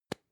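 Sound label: lipstick open, Bathroom; haus-garten; badezimmer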